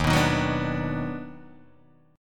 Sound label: Eb Minor Major 7th Sharp 5th, Guitar Open D